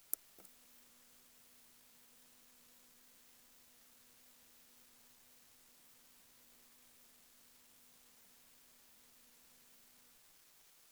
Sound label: Greater Horseshoe